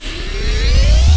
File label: Power Up; Total Chaos Nukem 3d Pack; duke